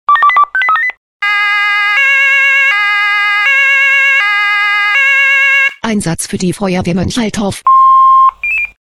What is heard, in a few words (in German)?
SMS Alarmierungstone; FW Moenchaltorf